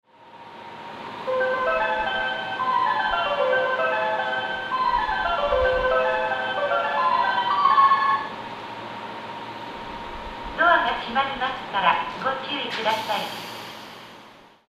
melody, station

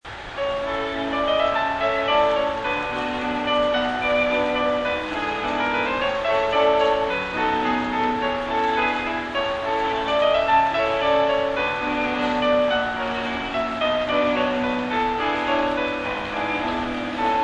melody, station

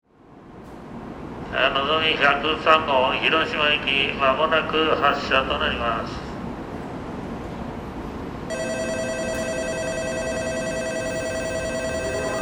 nagoya bell; tokaidos; jrcentral

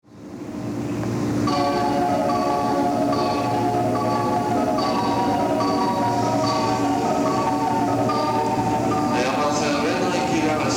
Omiya melody; jreast; utsunomiya

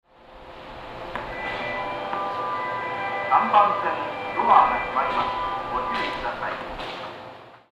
melody, station

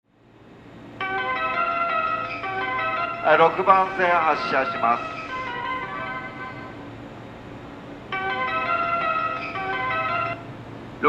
melody, station

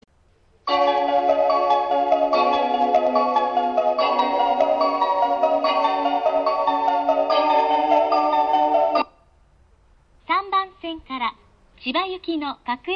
melody

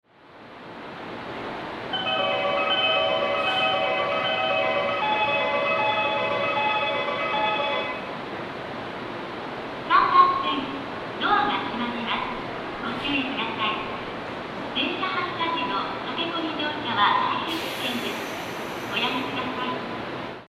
inage 3 melody; jreast; sobu

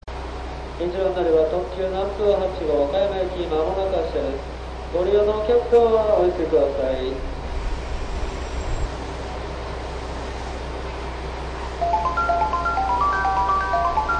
melody; tosa; nakamura

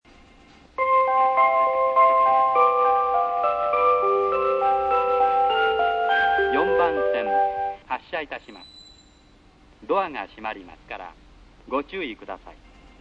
melody

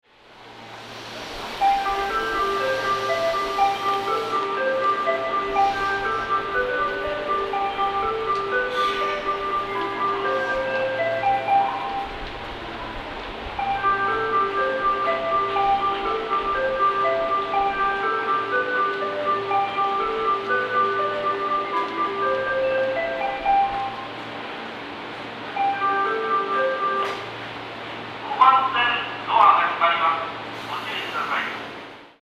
akihabara melody; jreast; sobu

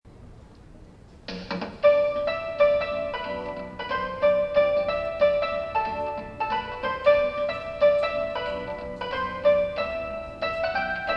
melody

